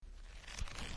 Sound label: book end